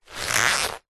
leather handbag or pouch handling creaks

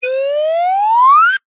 cartoon slide whistle ascend

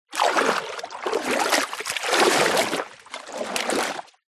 nature water movements surface deep; zapsplat

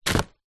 household object throw into dustbin

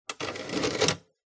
household dvd player disc tray close

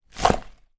foley flowers place into glass vase with water in

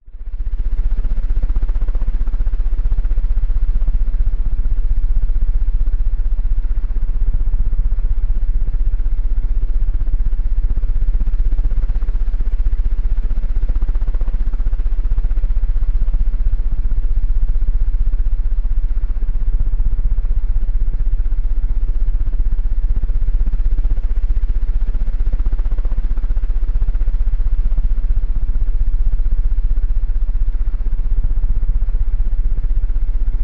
household ceiling fan spin fast from above

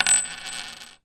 household ring jewelry down on wood surface; zapsplat

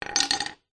industrial hammer claw set down garage floor

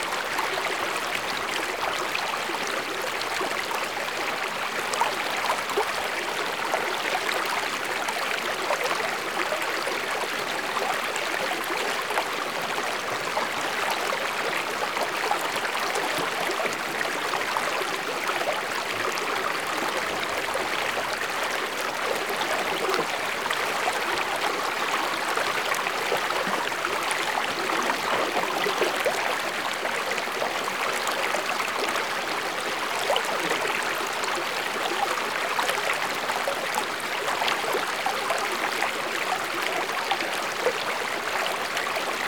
Stream Water, Medium Flow; lilesound

